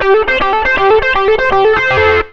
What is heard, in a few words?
SOLO L, Electric & Acoustic Guitar Loops